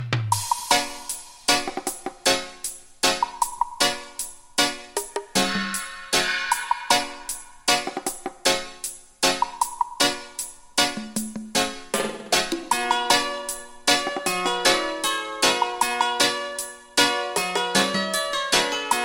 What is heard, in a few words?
bass